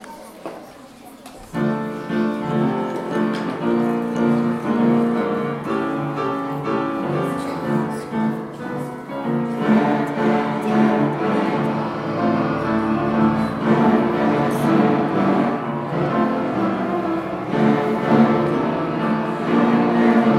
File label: music concert